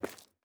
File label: Footstep Concrete Walking; Character Sounds